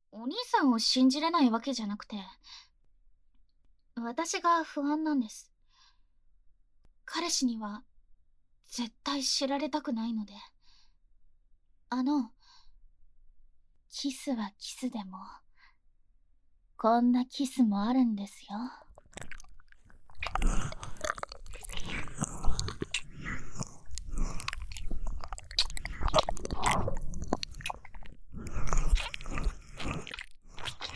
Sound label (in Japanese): W耳舐めされながら・ディープキス・フェラ・媚び媚びセックスされる音声